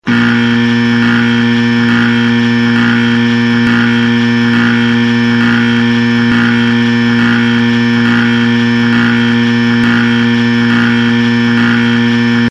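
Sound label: long buzzer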